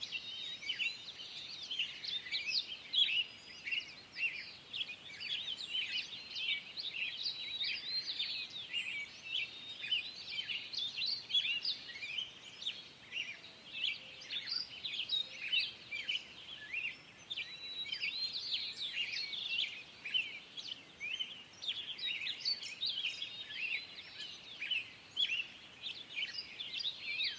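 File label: fairy sweet-voiced gentle sound of birds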